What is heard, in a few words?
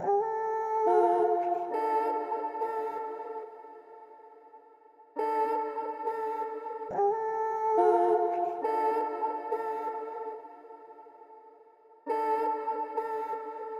Vocal Loop, 139 BPM D Min; Eclipse; Vocal Loops